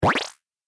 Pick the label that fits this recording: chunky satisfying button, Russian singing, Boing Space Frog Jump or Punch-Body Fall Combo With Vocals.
Boing Space Frog Jump